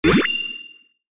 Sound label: shield